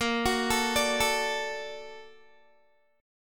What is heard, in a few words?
Bb Augmented Major 7th Bb down Guitar; Bb+M7; arpeggiated